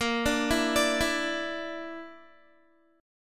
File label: A sharp Major Flat 5th, Guitar; A#Mb5; arpeggiated